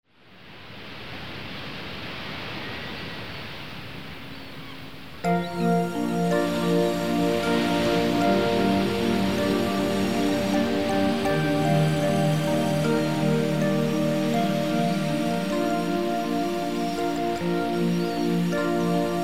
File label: Ambience